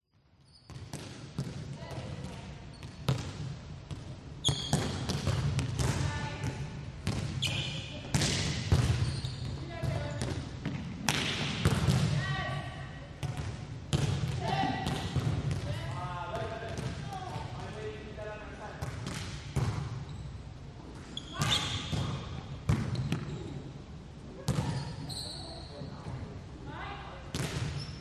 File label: school gymnasium volleyball practice squeaks shoes balls bounce coach players voices